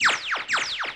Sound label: Demigod Plasma Spray; Playable Tutorial Level